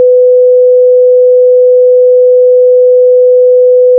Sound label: sine 500Hz 1dB dither; ns 16bit